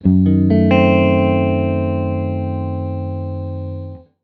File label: Guitar One Shots G half°; Guitars; Ghosthack; Advent Calendar 2019; Xmass